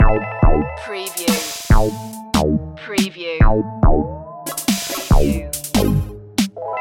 alien pets loop

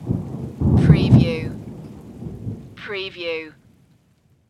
thunder

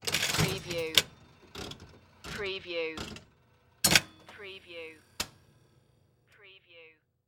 Slot Machine Pull; sound effects bible

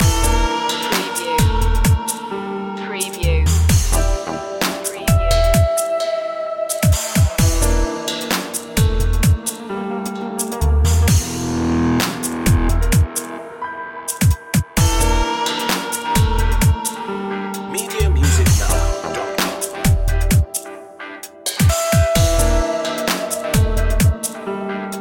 detective loop

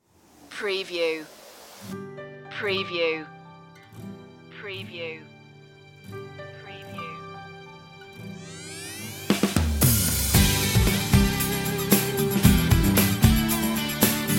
saturday guitar

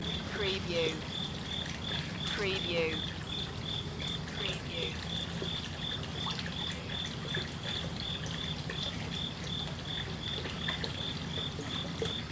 Koi Pond With Crickets Loop

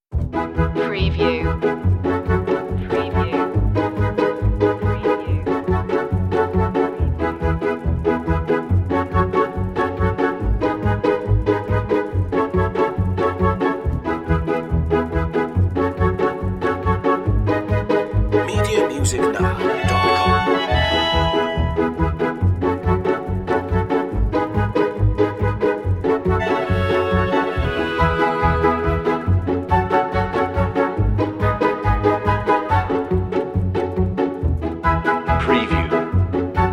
strings woodwinds

